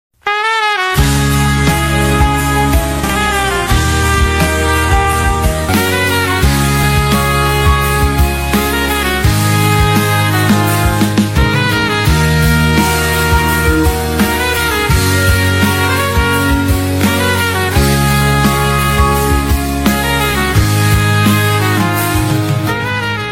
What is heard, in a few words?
tararara ringtone bgm lofi song